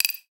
Digital Rattle

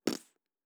Mouth Puff

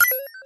Arpeggiated UI Beep

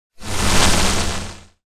Sci-fi Transition

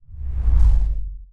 Swoosh Transition

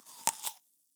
Apple Bite Crackle

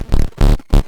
Miscellaneous Glitch

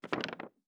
Dry Short Paper Crumple

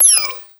Single UI Beep

